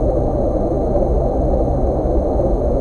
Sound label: engine; Earth and Beyond; EA Games